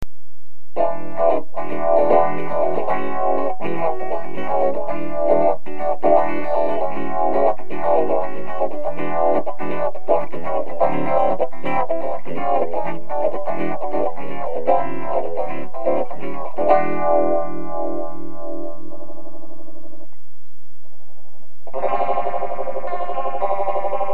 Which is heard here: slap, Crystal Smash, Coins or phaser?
phaser